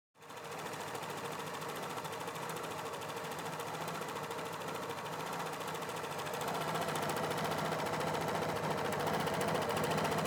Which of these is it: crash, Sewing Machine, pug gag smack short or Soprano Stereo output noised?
Sewing Machine